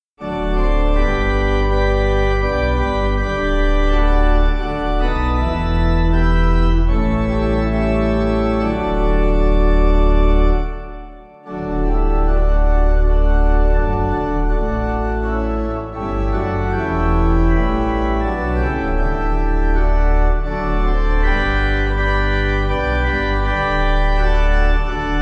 Tryggare Pipe

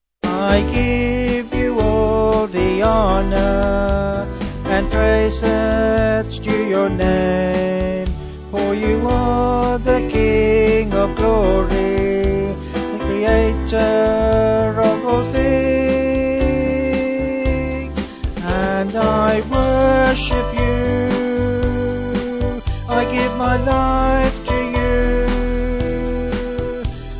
Vocals Band

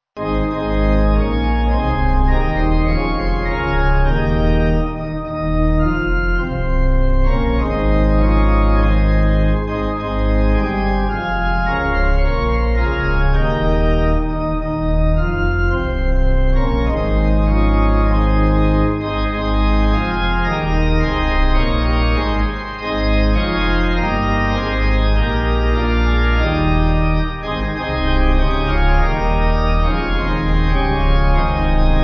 Pipe

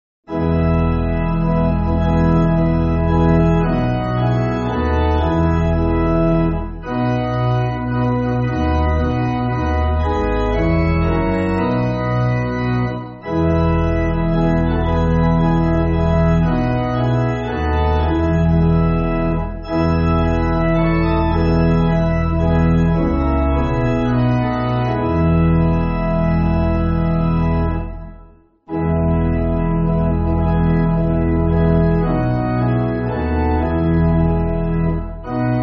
Pipe, church music; American 6

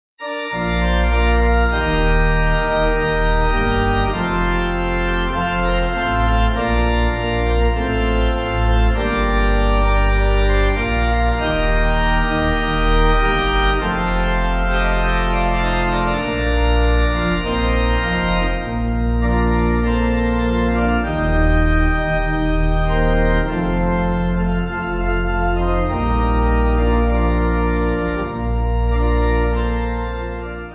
Pipe

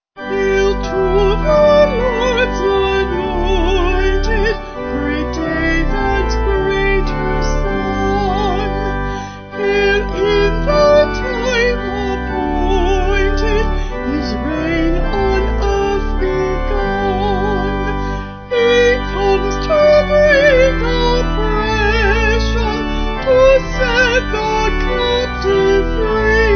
Hail To The Lords Woodbird Vocal Organ

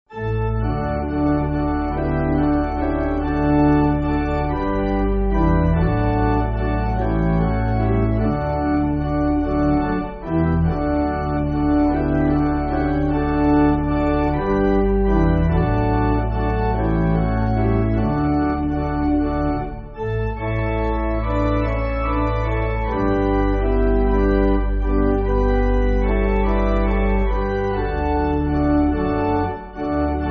Pipe Organ, Backing Files for Churches; The Gods Palace

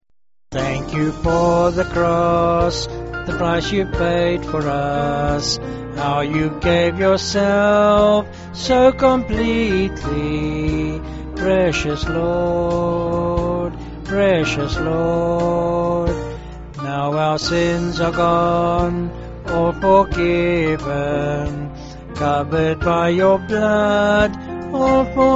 Vocals

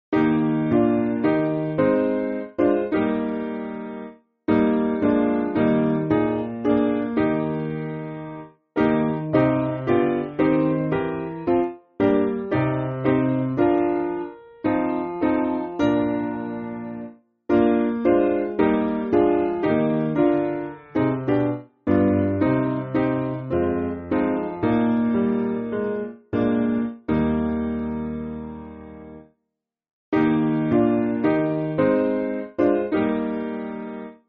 Piano, church music

